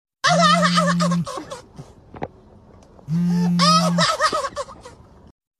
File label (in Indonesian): nada notifikasi ketawa